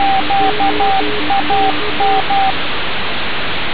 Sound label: signal